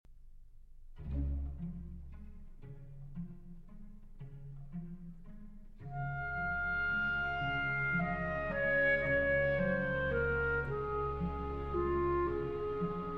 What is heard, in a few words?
Clarinet Concerto in F Minor, Andante Pastorale